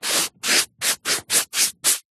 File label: office sponge brush strokes on paper; zapsplat